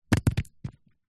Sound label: foley rock small roll land compacted damp mud ground; zapsplat